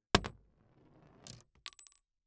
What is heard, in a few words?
Weapon Bullet Shell 7mm Drop On Trunk; AdobeCC